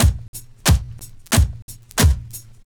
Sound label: VNYL, 90 DRUMS